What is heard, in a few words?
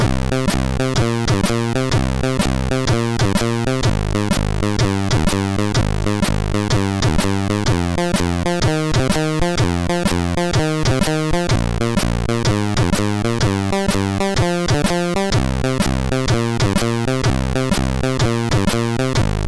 Bookstore, MOS6581R3; sid